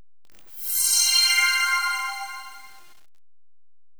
button ok; easyrpg; WitchsHeart

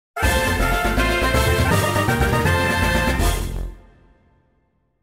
Evolution Success; Pokemon Opalo; Pokemon Essentials

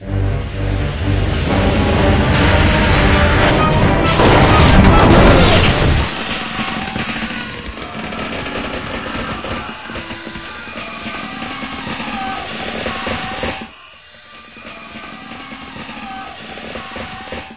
BURST; ScummVM; Full Throttle

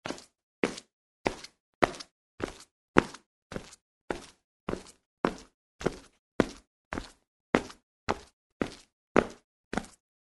walk school; easyrpg; WitchsHeart

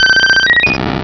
Cry

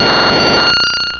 Cry; Pokemon Iberia V1.03; Pokemon Essentials

